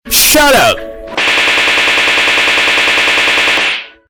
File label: shut up multiple smacks